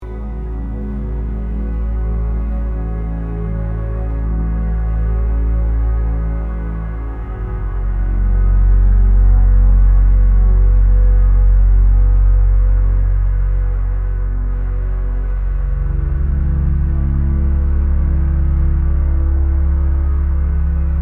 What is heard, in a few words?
celestial whispers